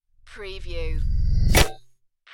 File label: Magnet Attract Metal